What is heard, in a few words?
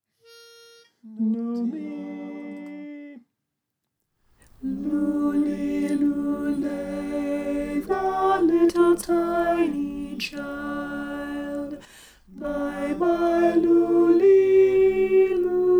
alto